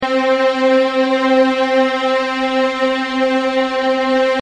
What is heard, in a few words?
strings Loop Middle C Oscilloscope